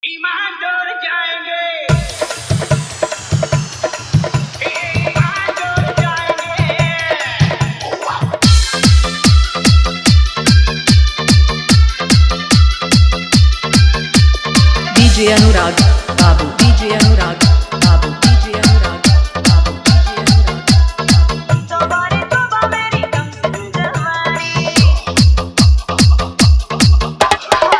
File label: Faadu Dhollki Dance Mix